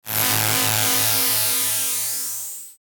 Static Delay Phase Ascend, Static Bright Filter Chopper; Production Element Imaging Element Accent Transition; Blastwave; Static Sound Effects